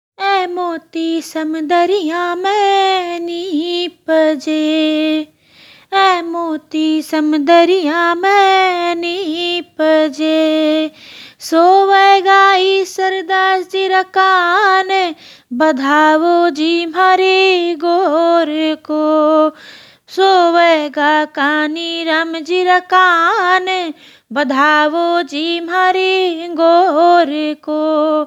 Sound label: Gangor badhava, gangor geet, marwari; बधावा; गनगोर गीत